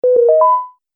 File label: Multimedia Correct, beep